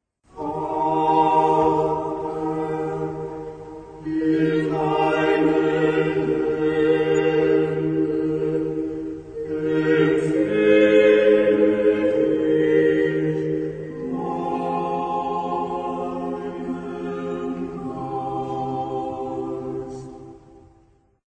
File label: Choir